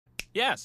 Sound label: *snap* yes!